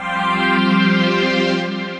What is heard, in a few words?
String Cluster; Synth Pad; Axis String Cluster; Ableton; Factory Packs; Synth Essentials